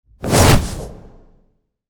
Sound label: Wipe; Ninja Tracks